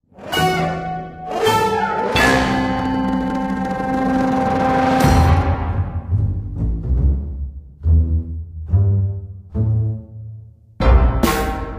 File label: Casio; Casio Hold-Up